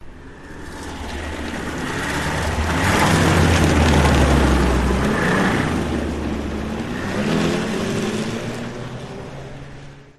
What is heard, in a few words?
Snowmobile Ski-doo passing by; your free sounds